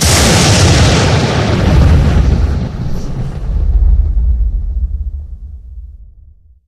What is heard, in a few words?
Piezo Really close, Explosions; DynamicEuropa